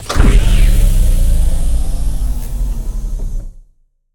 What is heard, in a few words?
door Space Ship hatchway; SoundMorph; Portals